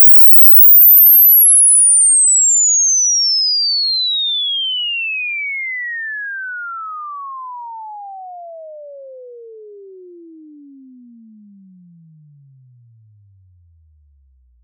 ESS 50-20k Inv; AgoraAutomated

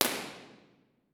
Dodec IR, Teatro Municipale Piacenza; Dodec IR B&K2250

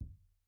IR Bin Sub (+10dB), Listening Room, binaural; IR Binaural 16bits

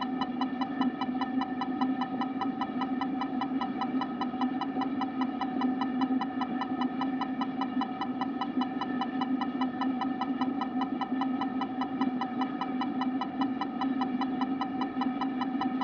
beep 16ms; CESI; LaSpezia

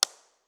IR sweep

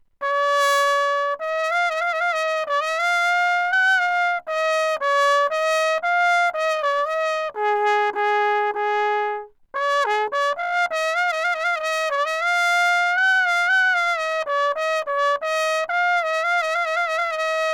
Trumpet, Anecoic

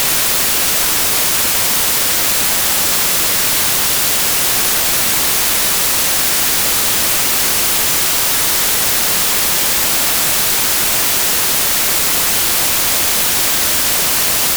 White Noise

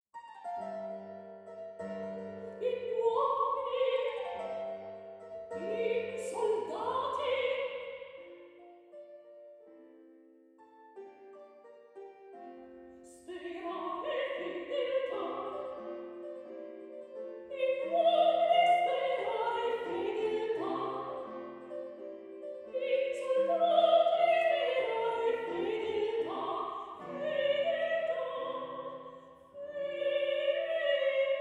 Music Cardioids; DTS

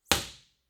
IR

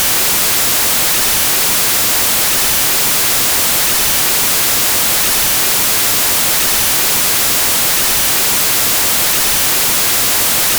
MLS16A stereo

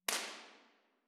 IR Ball; IMP-RESP; Teatro Verdi di Trieste

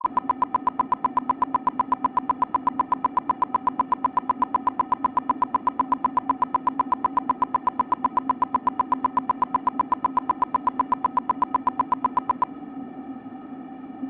burst 16ms 1kHz; Mic1 tubetto; CESI; LaSpezia

